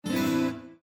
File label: Windows Battery Critical; Windows 7 Sounds; Afternoon